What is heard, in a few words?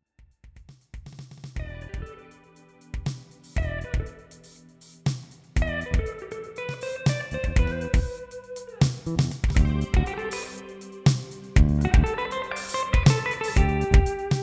Fusion